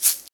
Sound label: Egg, shakers